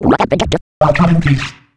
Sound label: pain; alienarena; grey2